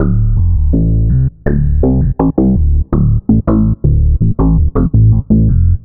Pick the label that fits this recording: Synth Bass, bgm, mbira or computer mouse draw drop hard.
Synth Bass